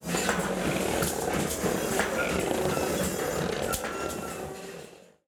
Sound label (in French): chaises